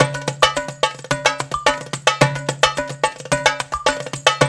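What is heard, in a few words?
108 bpm, Hip Hop; Spectrasonics - Supreme Beats - World Dance; Dara Hip-Hop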